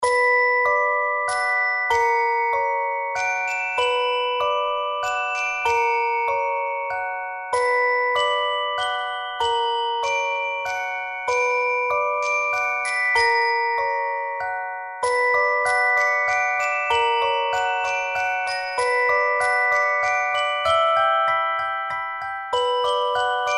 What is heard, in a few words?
bg Horror; midautumn2014